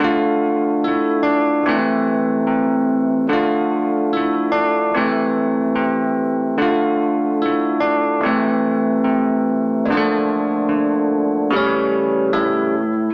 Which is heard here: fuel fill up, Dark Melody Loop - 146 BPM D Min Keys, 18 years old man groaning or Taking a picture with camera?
Dark Melody Loop - 146 BPM D Min Keys